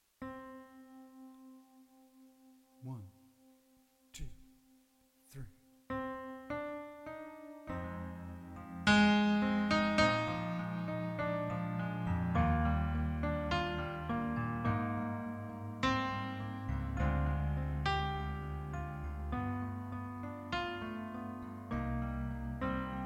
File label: inst ballad; Autumn - Inst. ballade K